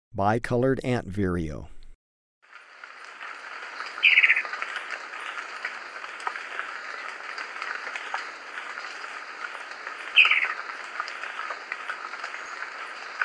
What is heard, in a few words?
Bicolored Antvireo, Birds of Ecuador; S. America